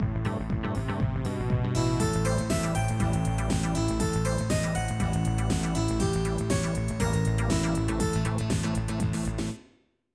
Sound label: Continue - Ten Count Continues; Chippoke Ralph no Daibouken